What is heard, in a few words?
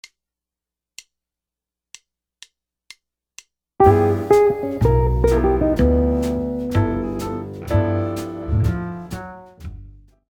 altered scales, guitar